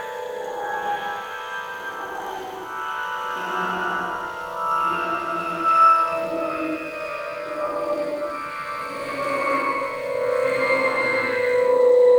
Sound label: Dissonant Surges - Endless Falling Loop, Shepard Ambiences; The Sound Pack Tree